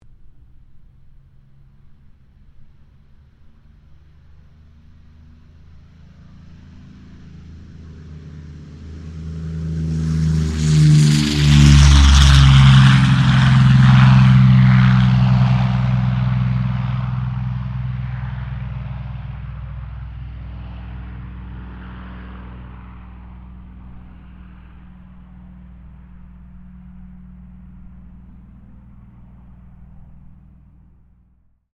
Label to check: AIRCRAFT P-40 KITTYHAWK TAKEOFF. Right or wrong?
Right